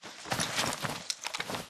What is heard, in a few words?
spectre zombie drag grunt; Titanfall 2